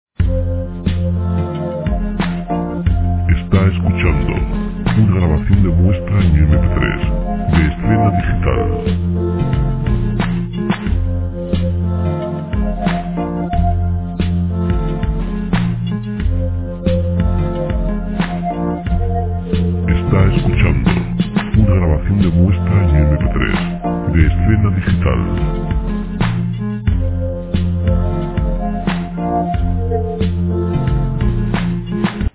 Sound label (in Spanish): documental; documentales